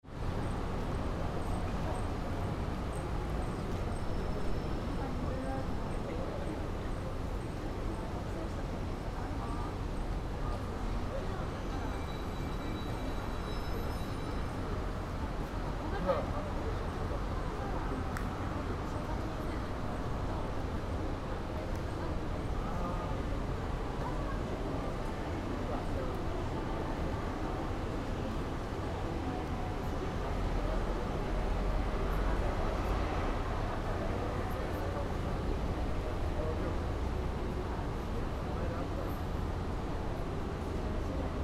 station square, Fukushima

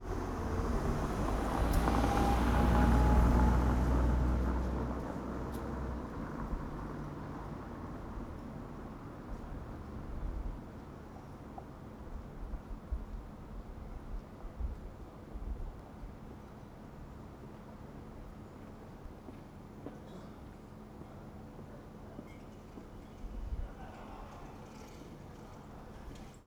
bunka street

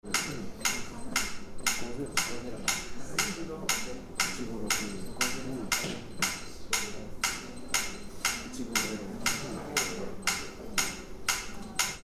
metronome, soundscapes

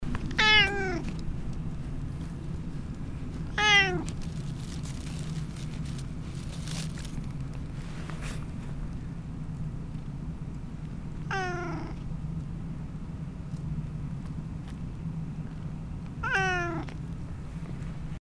kanayagawa cat

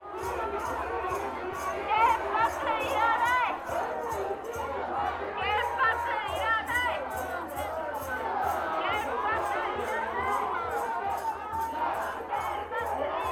fukushima station street

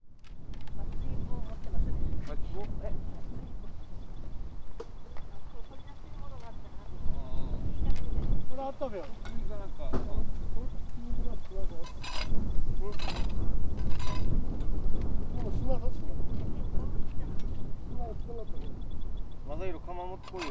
kanaya decontami

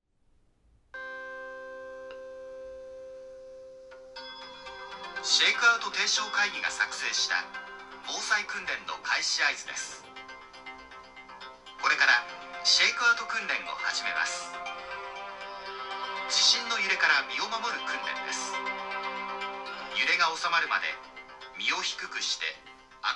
evacuation drill shakeout; 地震発生; 訓練放送 （その３）; 毎年恒例の避難訓練．コロナ禍後でも切迫感がないのは変わらない．

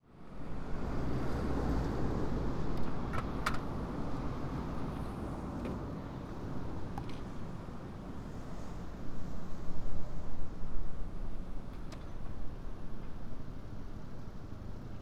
route 4 measurement

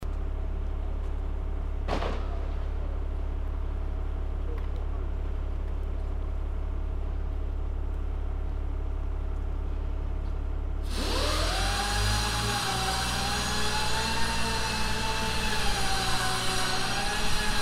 construction outside

